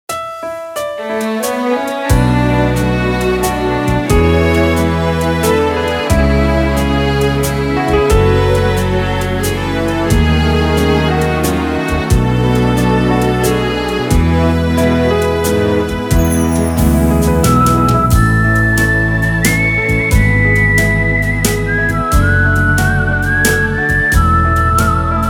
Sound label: Whistle